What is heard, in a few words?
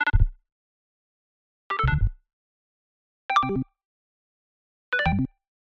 Error